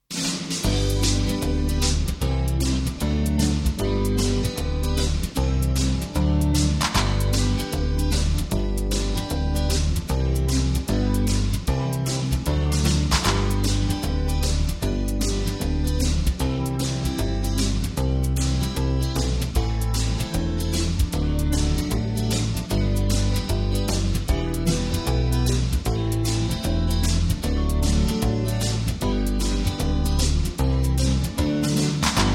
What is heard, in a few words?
G No Lead Guitar